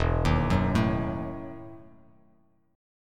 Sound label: E Major 7th Suspended 2nd Suspended 4th down Bass Standard, chord; EM7sus2sus4 arpeggiated